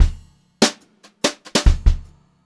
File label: 144 fill, Brushed Drum Loops; USB Soundscan vol.39; AKAI